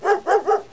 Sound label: dog